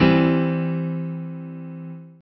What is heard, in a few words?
chord; bsm aufnahmetest mustertest